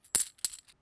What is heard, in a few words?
coin; お金を触る音